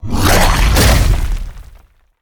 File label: Boss Mech Turtle, monster